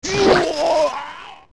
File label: Hit; Ganondorf; zelda; OOT